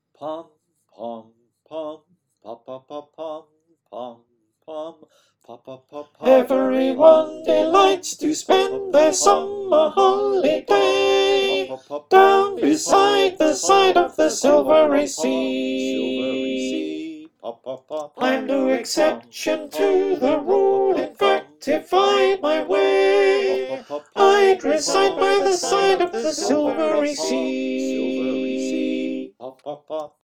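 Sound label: alto, choir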